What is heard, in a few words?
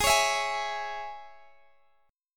G sharp Suspended 4th Sharp 5th Guitar Open G chord, down